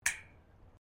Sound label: METAL ON METAL RAILING